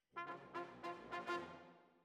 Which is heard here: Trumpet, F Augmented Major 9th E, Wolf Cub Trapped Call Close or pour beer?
Trumpet